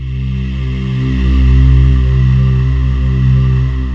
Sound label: TANGERINE, ROLAND JV80 - 880; Rubber Chicken Software Sample Bank